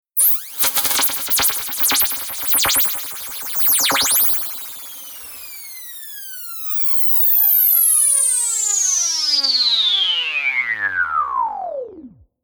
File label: Computer Interface Telemetry Static Radio Wizz Down Long Robot Interface; Tom Hutchings